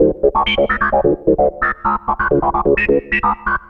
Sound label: Atonaloop, Industrial Analog